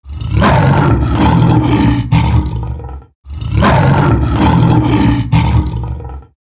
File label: Lion Repeat